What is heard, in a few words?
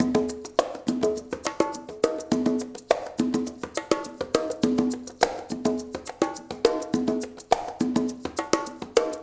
ECHO, COW STIX; Spectrasonics; Liquid Grooves 1 & 2; 104 COWSTIX